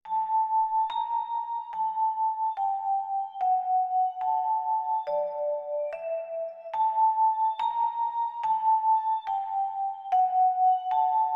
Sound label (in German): Vibraphon